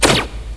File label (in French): silencieux; TheDanes UT Projects; AMLP1shot1killV1